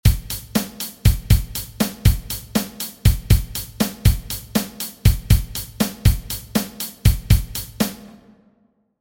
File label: drum pattern 8beat